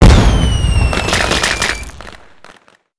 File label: Cherry Bomb Impact